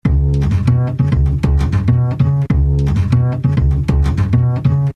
jazz riff

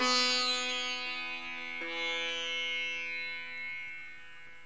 sitar

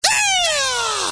HEYAAH

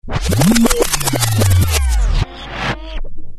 SPIN BK, VINYL

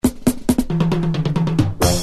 BRK FILL, beats

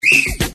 WHISTLE

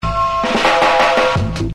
BEATS

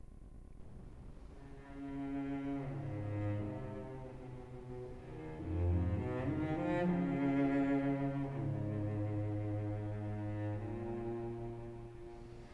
strings

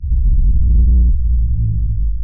d bass dist fucked

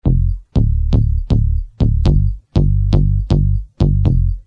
bass

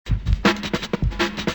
FUNKY D, drums

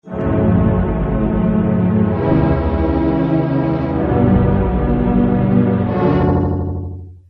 SCARY PAD

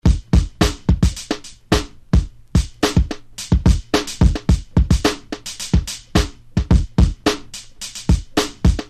COOL BEAT

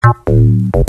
DIGI BASS

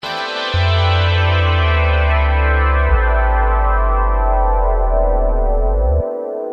PAD FALL

